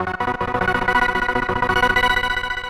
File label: synth